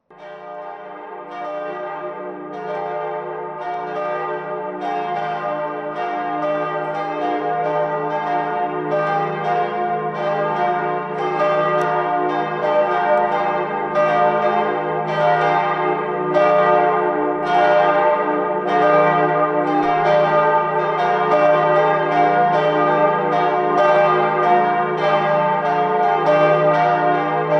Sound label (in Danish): Kirkeklokker